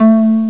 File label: jazz harp; Atari Mega Archive